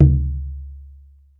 deep tom drum single hit d key, tom drum one shot tuned to the D note